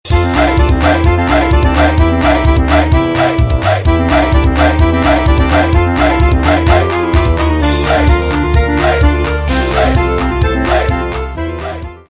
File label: polka